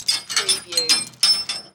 Chain Metal Clanging

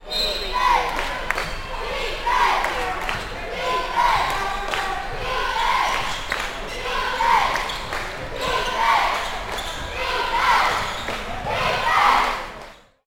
Basketball Cheerleaders Chanting

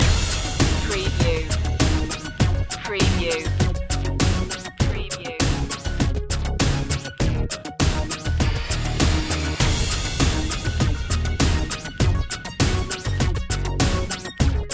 deep impacter loop

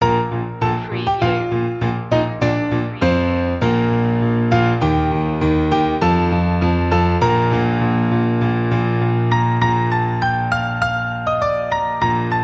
joy to the world piano loop long; media music now

